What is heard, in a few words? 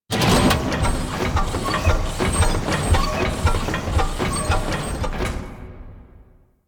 Misc Machines, Asteroid; Ambience; Warframe; Grineer Asteroid